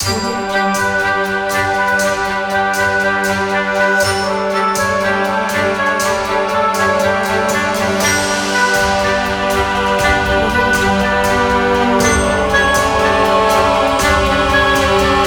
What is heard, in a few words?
wonderous discovery music